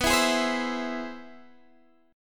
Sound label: C Augmented Major 7th B